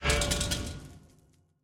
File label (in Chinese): 试炼刷怪笼检测玩家声